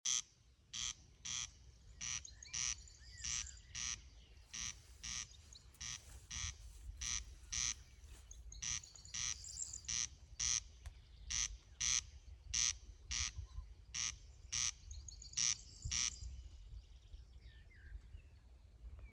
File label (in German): Wachtelkoenig Ruf